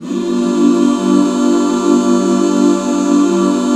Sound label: G 7, back chords; Sound & Vision - Gigapack II CD 1